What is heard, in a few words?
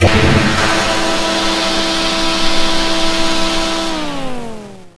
flaps, F6F Hellcat; Bombable; flightgear